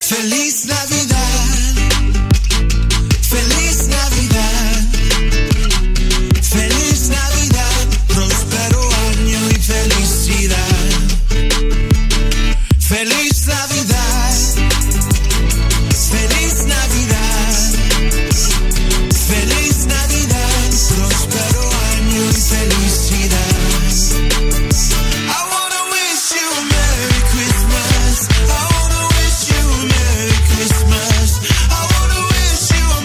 Trap Remix, tono de llamada

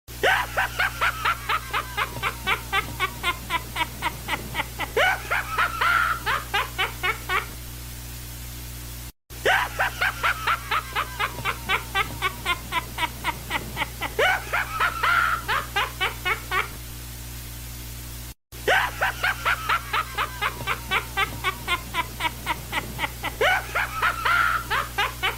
Risa de Bruja

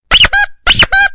Pato De Goma

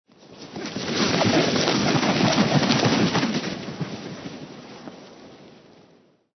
batido alas

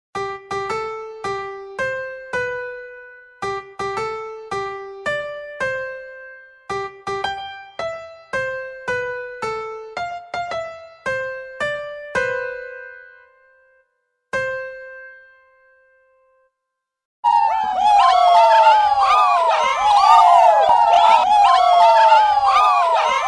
sonido cumpleanos

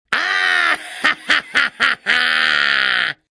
risa dibujos animados

